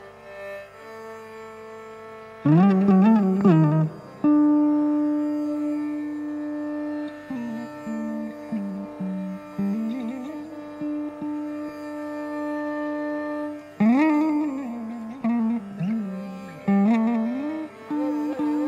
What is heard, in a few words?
End rhyme R Hemavati, ālāpana; Srinivas’s end-rhyme on R in his Hēmavati ālāpana